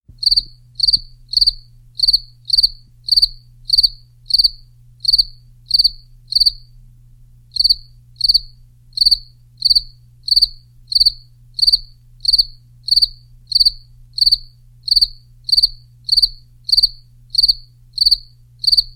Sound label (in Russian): Сверч или ноч птица